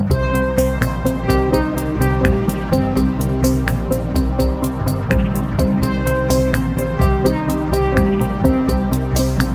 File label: Electro rb